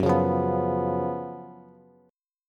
Gb Major 7th Suspended 4th Gb Bass; GbM7sus4; strummed; JGuitar

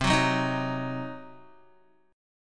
C Diminished, Guitar, chord; strummed; JGuitar

